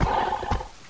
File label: gonome run